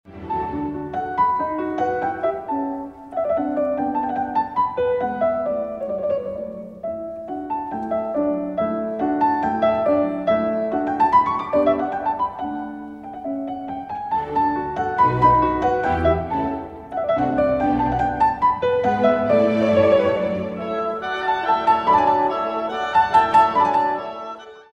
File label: Piano Concerto in G major; Mozart